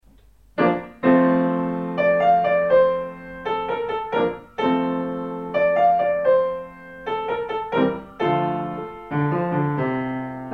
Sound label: African dance